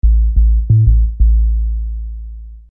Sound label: Low Sub